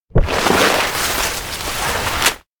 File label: elec big crane grow; anemoi